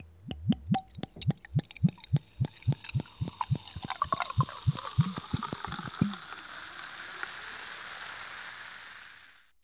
pop bottle, kitchen; 1000fx

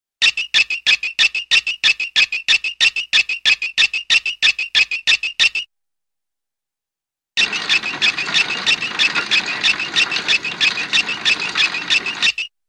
Funny Repetitive Rapid Metal Squeaks; Hollywood Edge Cartoon Trax

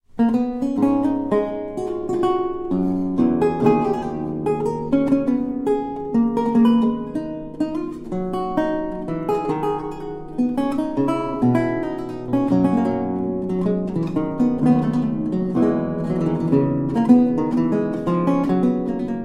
La Cavaliere Courante, Luth; Charles Mouton

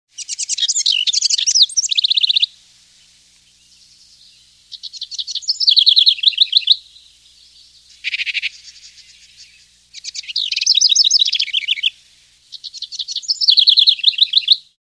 Wren Sings; Birds And Animals

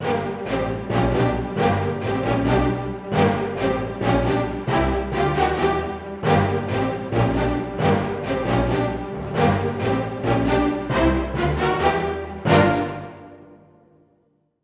Breaking News

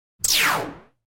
Synth Gun Ricochet Multi-Pitched; Electronic Sci-fi

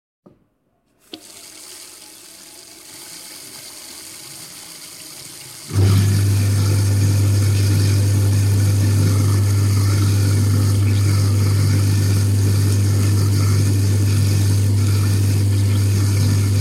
Waste Disposal; 100 sound effects

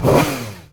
CAR START; 1000fx

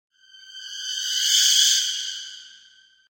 Eerie high-pitched synthesizer pad; Music effect; Hollywood Edge Sound Designers Tool Kit

